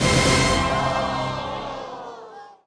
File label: taming fail